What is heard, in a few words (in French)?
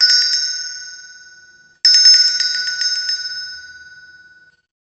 Clochettes